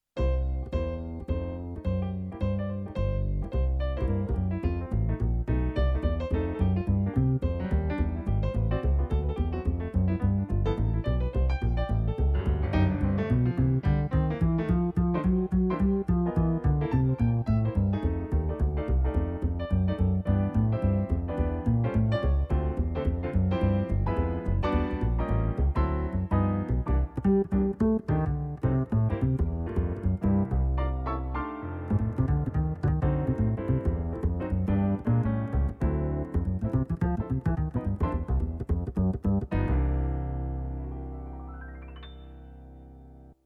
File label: yamaha p-255